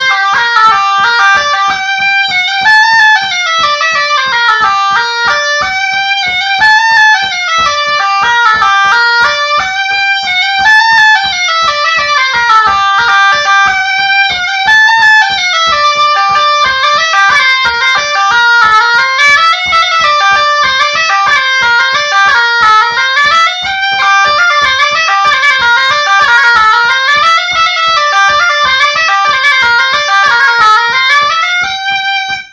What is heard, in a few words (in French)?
La Bourrée à Plane; Bourrees